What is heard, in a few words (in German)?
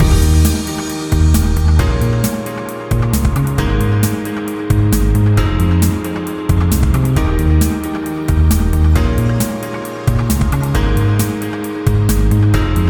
Disco; Kiss Before Midnight; Windows; Disco Freundschaft